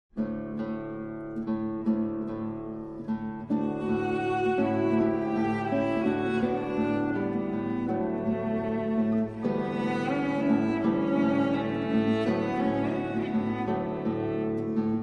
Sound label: Caprice Catalan